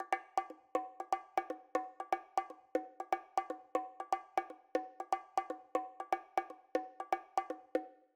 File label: Bongo Pattern